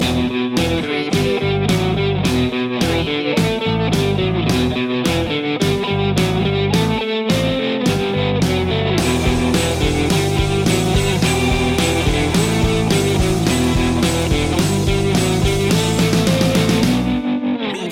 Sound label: indie style loop